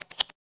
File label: LOOP, 160 BPM; Ueberschall; Drum & Bass Flash Trackz